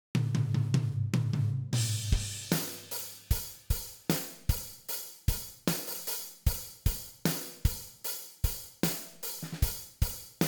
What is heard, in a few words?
Drum